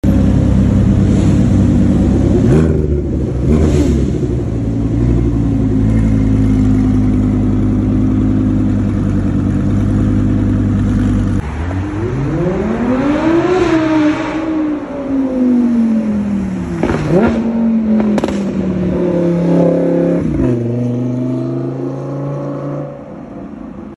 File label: Audi exhaust